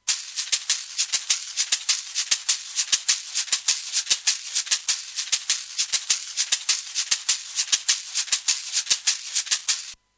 Shaker Bettermaker

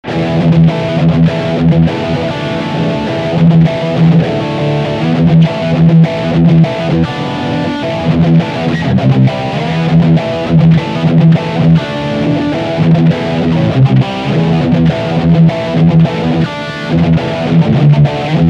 Power Riff Humbucker

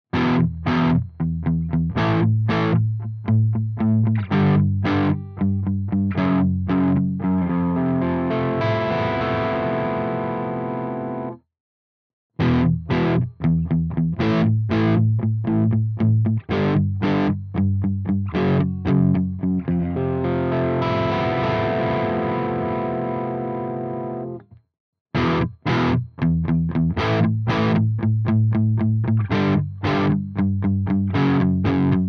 FRM300 crunch Riff

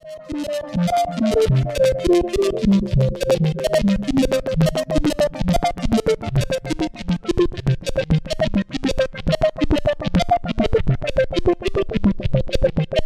Waldorf 2 Pole Synth Sequencer Tremolo

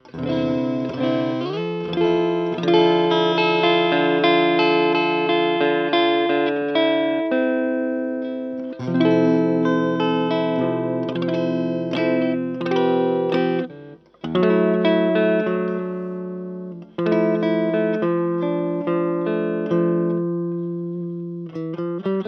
ESP Active Mode Both Clean; Ben Weinman

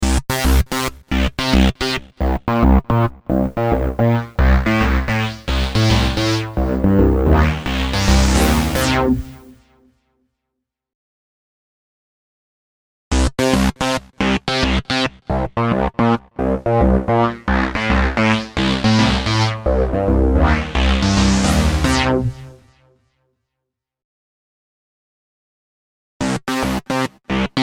Virus TI2 8 Characters

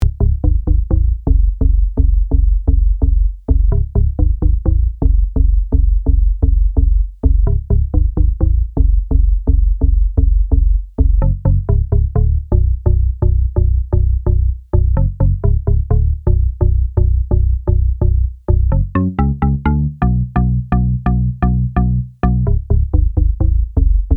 MINIFREAK

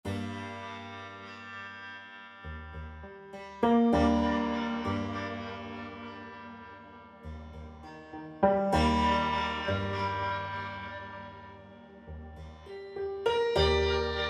Slwomo Echo Arp; ASCEND

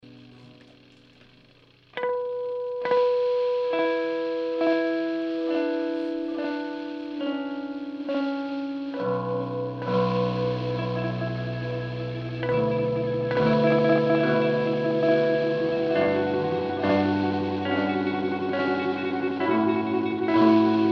Delay

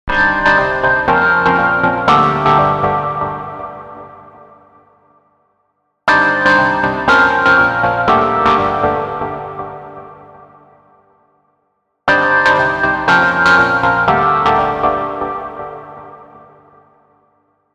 Drive Chord Hits Verb

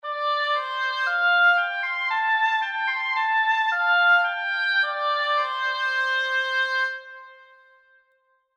Oboe 5 Micposition plus Hall; Oboe HW WW; New Hollywood Hall

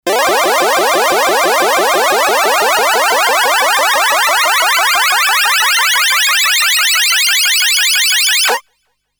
ASM Hydrasynth SFX Arcade

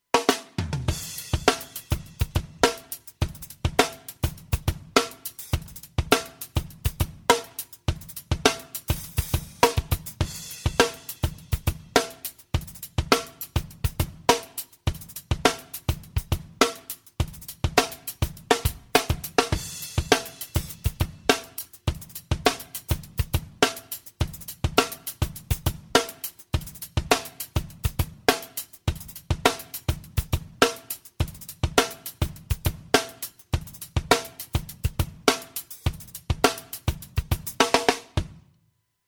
Elysia XFilter Drums High Mid Boost

